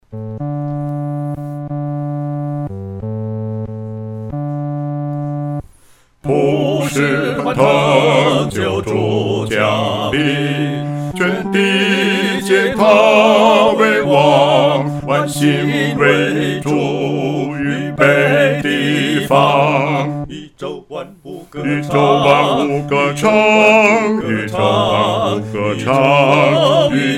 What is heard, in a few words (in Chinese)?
独唱 第四声